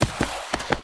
Deer Sled Walk

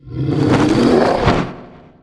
MudMan Die

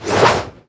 One Hammer; Seer; GamigoNA

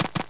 Crab Run; Seer; GamigoNA

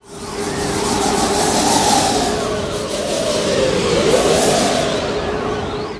Wind Worker Idle

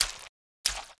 Scorpion Back; Seer; GamigoNA

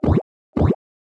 MushRoom Walk; Seer; GamigoNA

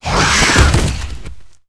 Leopard die

